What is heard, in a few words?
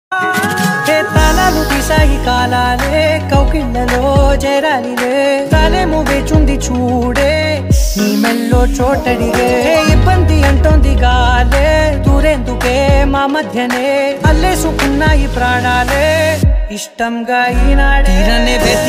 song ringtone; sr kalyanamandapam movie